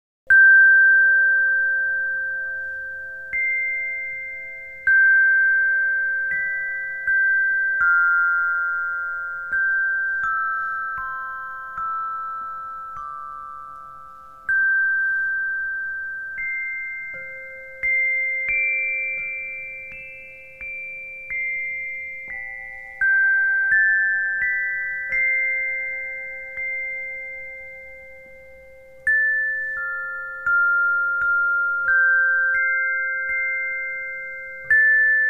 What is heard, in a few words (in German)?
Xylophon Klangstäbe